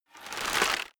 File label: bow load